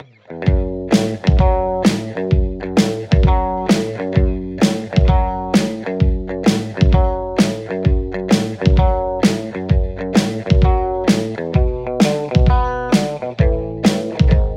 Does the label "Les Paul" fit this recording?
Yes